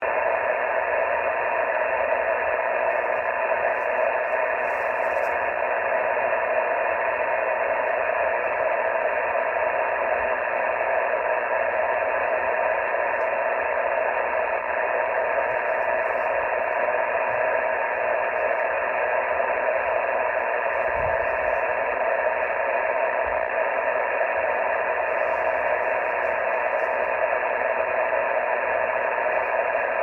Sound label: noise, Radio Frequency Interference; 2023 Feb. Lake Georgetown; 30m; This is the audio directly as recorded by my phone; Documenting noise at Lake Georgetown; I heard some radio